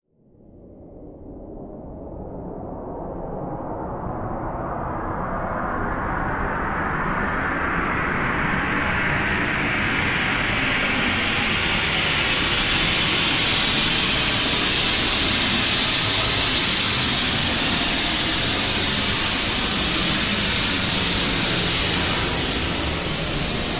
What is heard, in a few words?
Sci-Fi SFX